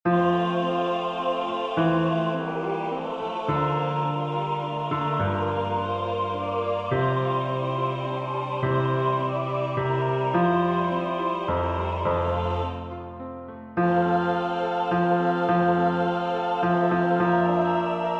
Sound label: Laudate Dominum Bass, rehearsal tracks